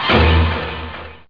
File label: orch